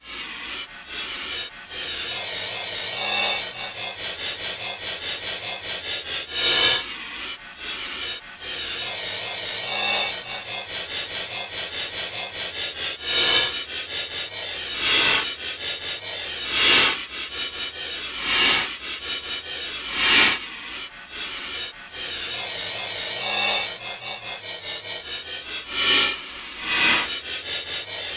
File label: reverse cymbal